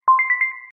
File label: High Battery; Hisense U820